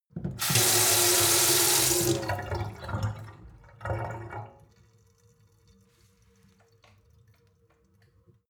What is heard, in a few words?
sink water flow hard; industrie-wirtschaft; gastronomie